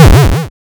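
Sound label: waveform FM square sine envelope silence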